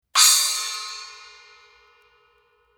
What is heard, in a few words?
splash 6, cymbal; sabian